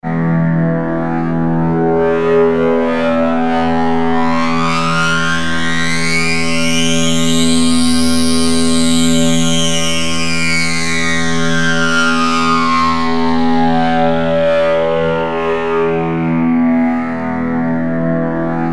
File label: sync resonant cutting pad; sweeps-swells